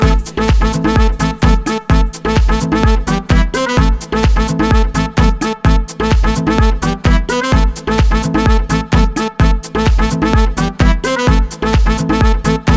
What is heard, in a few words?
Saxo Play